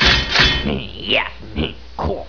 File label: armor; quakerepo fortress items